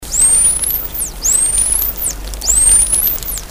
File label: SW, dolphins signatures; Nana